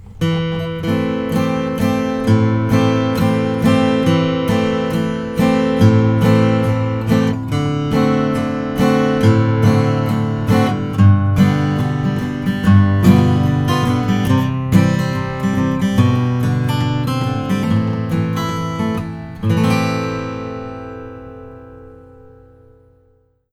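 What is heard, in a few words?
bourgeois om custom short scale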